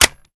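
weapon foley assault rifle xmag reload fast mag in; gmod; viper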